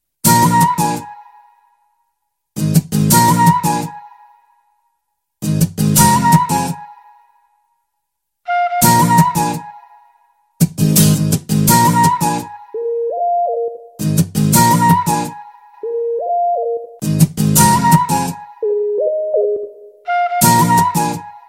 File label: guitar and flute